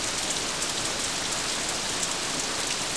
rain

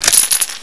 ana foley

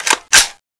draw

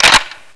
aw50 foley

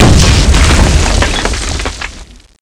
bazooka stone explode

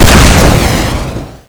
rocket launcher shoot; chicken fortress 2

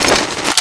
at4l clip in

